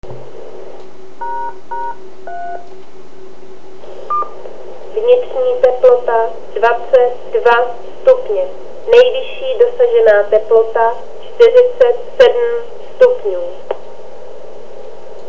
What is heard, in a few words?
air DTMF cmd cross cross; aktuální teplota v převaděčí / nejvyšší zaznamenaná teplota v převaděči od posledního zresetování; OK0BEZ; 70cm RPT